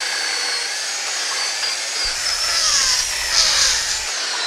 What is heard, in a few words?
Saw Head Torque Down, saws